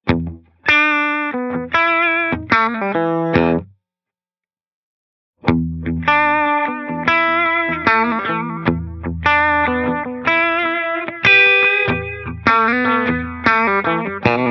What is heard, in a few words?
Analog Tube BBD, echosystem